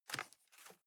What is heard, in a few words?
cardboard box open unfold single; objekte